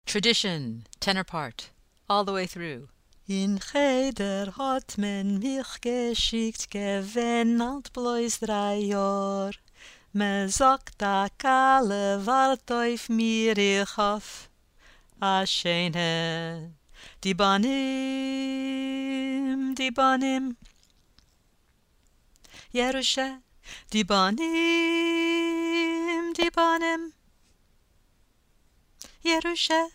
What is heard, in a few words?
tenor, chorus; all the way through